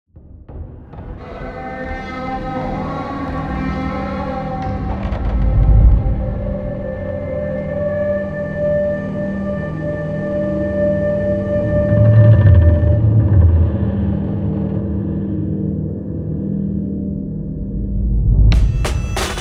celtic atmospheres

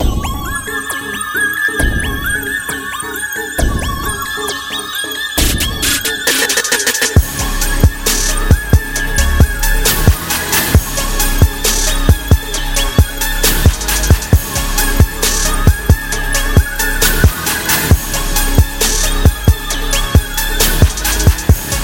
trap

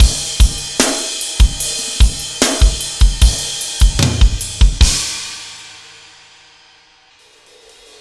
chorus 75 bpm

